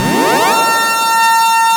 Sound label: HOE DOWN, SYNTH; Keith Emerson Signature Series